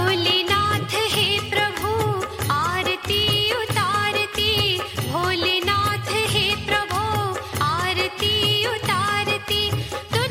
Aarti ringtone; bholenath hey prabhu aarti utarti bholenath hey prabhu

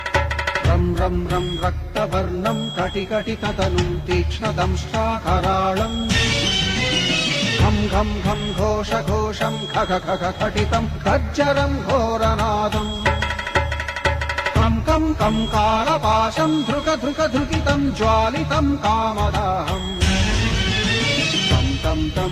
maha kalbhairav powerful mantra ringtone

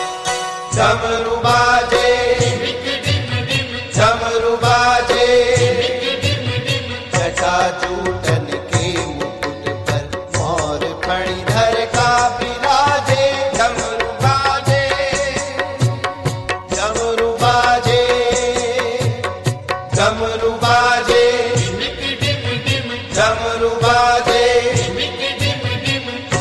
damaru baje dimik dim dim damru baaje ringtone

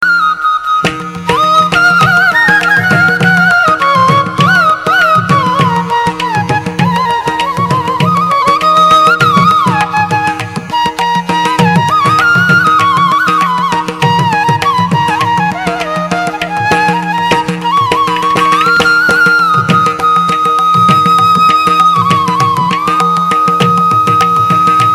musical ringtone

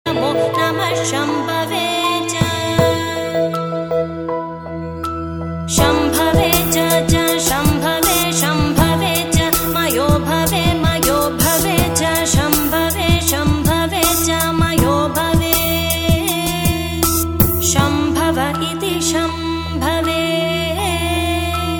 Shambhave cha mayobhawe cha mantra ringtone

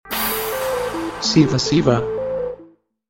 sms tone ringtone; shiva shiva

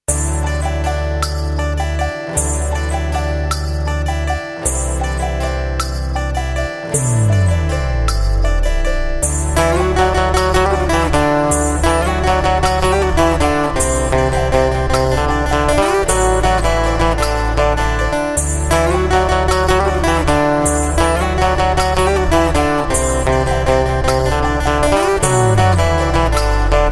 instrumental music ringtone

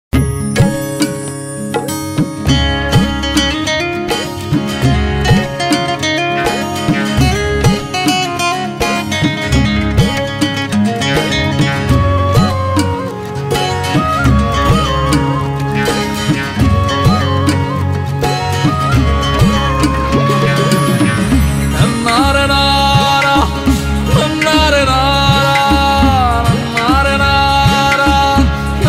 instrumental ringtone

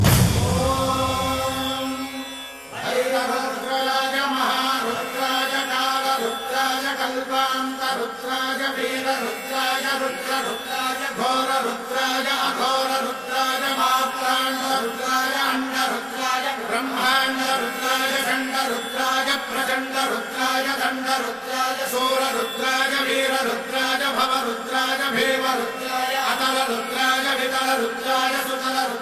Rudraya mantra ringtone

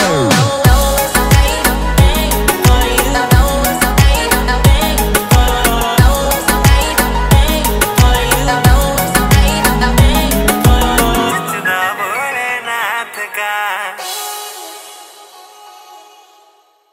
Instrumental Music Ringtone

